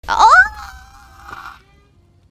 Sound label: screech muffled